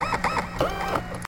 Robotic Moves

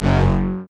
Double Saber

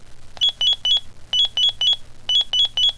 Minitor 5 Alert

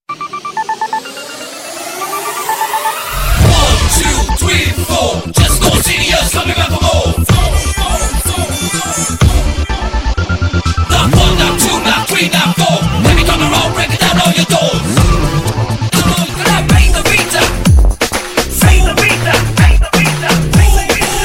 Theme Music

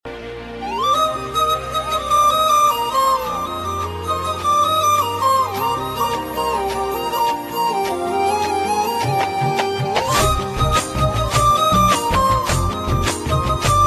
Beautiful Music

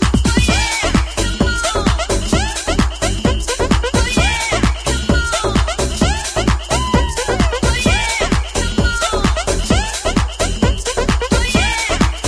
Ritmo

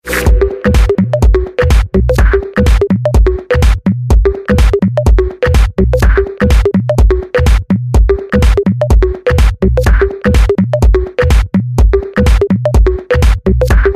Flute; Defender

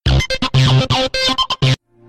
Shutdown; Dope OS

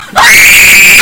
Screaming Lady

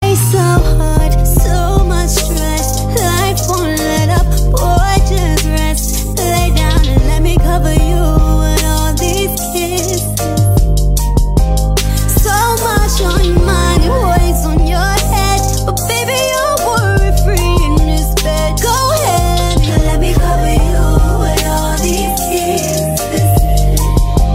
Kisses

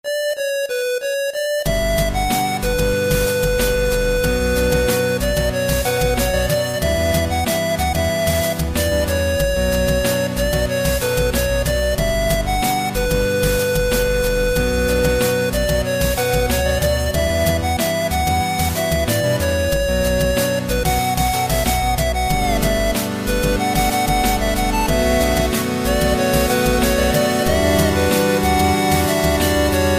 8 BIT

